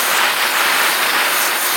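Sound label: missile nozzle loop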